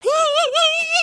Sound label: freakout; emotes